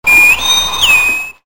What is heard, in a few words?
Bosun Whistle